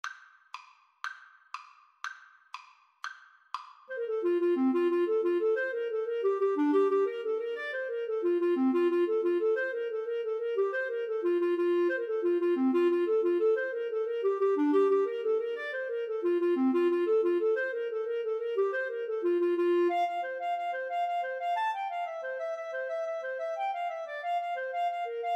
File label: irish jig medley, Clarinet Duet